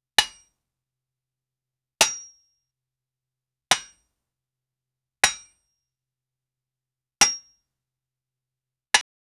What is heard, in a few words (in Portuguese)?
picareta batendo no miner, mining